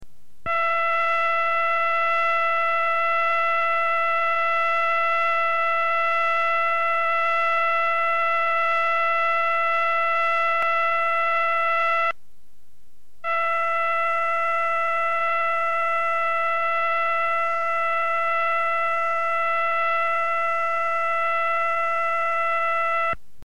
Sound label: Pulsar Integrated